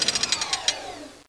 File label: empty end; laserfist shoota; cstrike